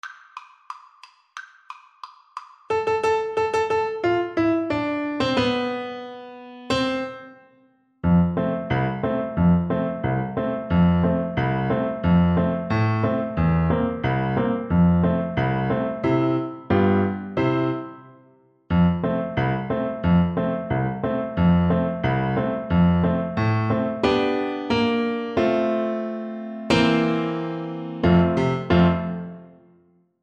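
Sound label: recorder karaoke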